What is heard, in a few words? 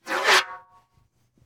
Button Bad